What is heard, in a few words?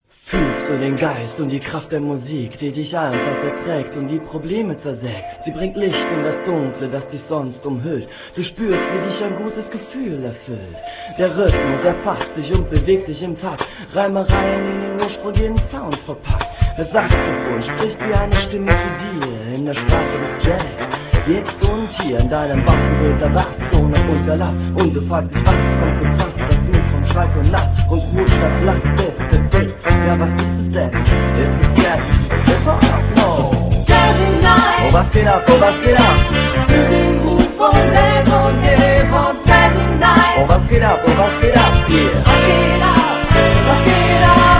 jazzy